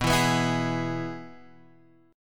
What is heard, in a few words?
E Major B